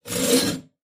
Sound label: bowsaw stroke